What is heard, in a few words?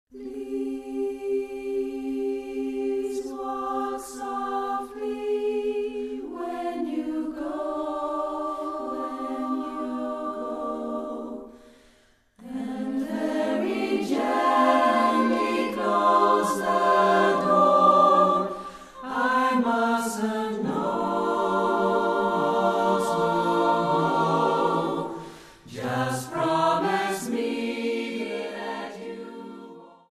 ssatb